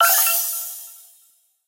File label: board bonus